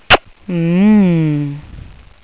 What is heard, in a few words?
kiss mmmm